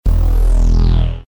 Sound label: ringtone